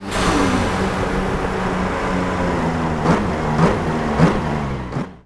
throttle off, vehicles; bmw 340i

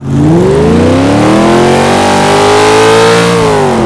first, vehicles; challenger70

